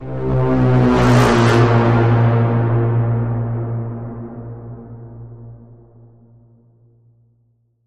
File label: Bend, C